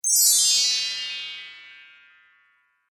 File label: Naughty Fairy Magic Disintegrate Spell; Twistedtracks